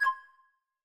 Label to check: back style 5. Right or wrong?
Right